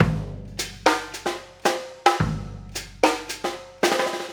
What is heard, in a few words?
Trap Kit, Drums